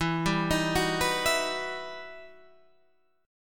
E Minor Major 9th E

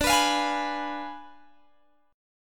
D Diminished 7th D, Guitar, Open G

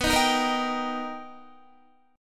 B Augmented Major 7th B Guitar, chord; B+M7; strummed; 18,18,15,15,15,15; JGuitar

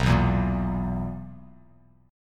B Major 7th Suspended 4th B down Bass Standard; BM7sus4; strummed

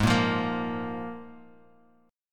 F Minor Double Flat 5th, Ab

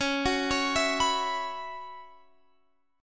Gb 7th Suspended 4th Db, chord

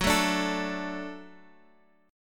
F sharp Minor Sharp 5th

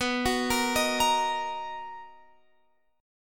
B Major 7th B down Guitar Open F; arpeggiated

